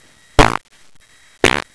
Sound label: fart